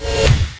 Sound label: Menu Slide In; Red Alert; RA2 Allied; Desktop Themes